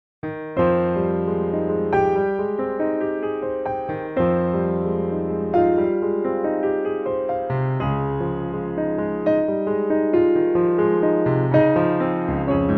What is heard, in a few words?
piano version; Classica; Musica sacra